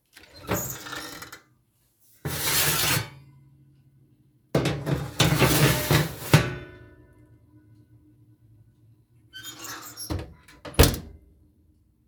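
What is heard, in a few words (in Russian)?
oven with taking out a baking tray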